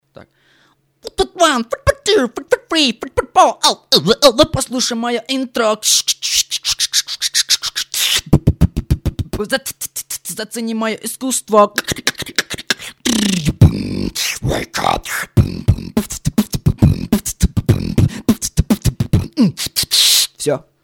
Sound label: wake up bbox